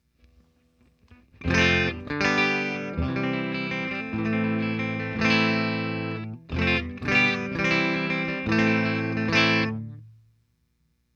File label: Fender Twin Reverb 2 clean